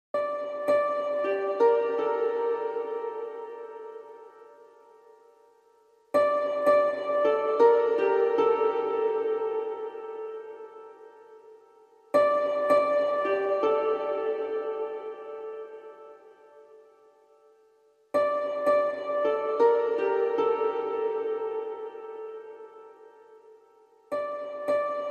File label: Harp Only